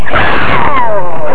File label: heya oooooo!; Grizzly 1.3.1